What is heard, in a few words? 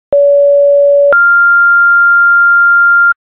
fire, tones